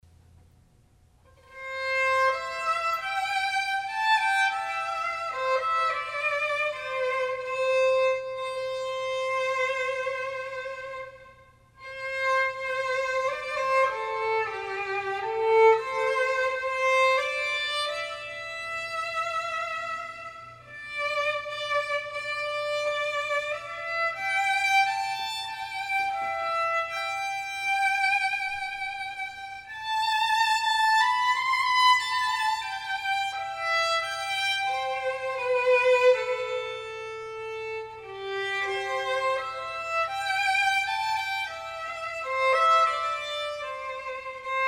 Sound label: violin; Be Thou My Vision